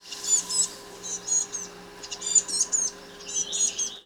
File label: Daurian redstart